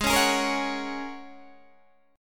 G sharp 9th Suspended 4th, Guitar, Open D

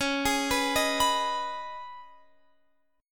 Db 7th Suspended 2nd, Guitar, Half Step Down

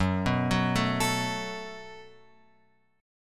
F sharp Minor Double Flat 5th F sharp; F#mbb5 Chord {2 2 4 2 X 5} arpeggiated

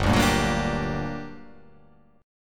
G Minor Major 7th G; chordshape; down

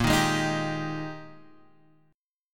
Ab Major 7th Suspended 2nd Bb, Guitar

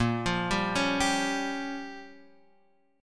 G Minor 7th Flat 5th

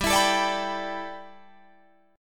G 6th Add 9th G Guitar Drop D, chord

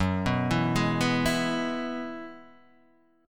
F sharp 7th Suspended 2nd Suspended 4th, down; arpeggiated

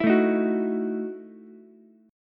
E Major 7th Suspended 2nd Suspended 4th, Ukulele; EM7sus2sus4 strummed

